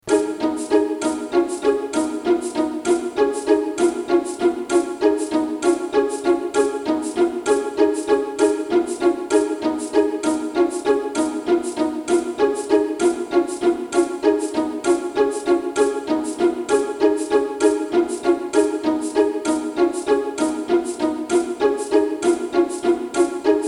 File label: Nzakara Harp Songs; Brenner; Vienna 2007